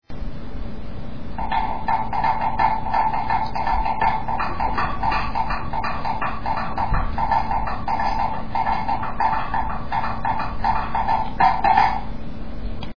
boungu, Africa musica; Etnologia

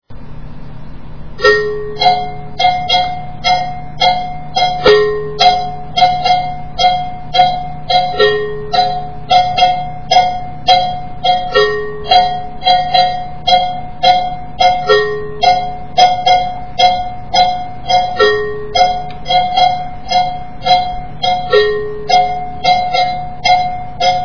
gangkogi; Etnologia

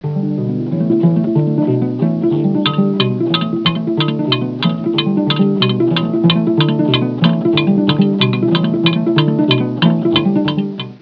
ngombi; Etnologia

